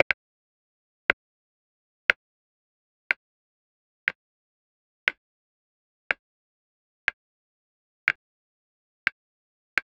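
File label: medieval survival, leather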